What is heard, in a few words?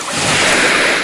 release missile; cameraman3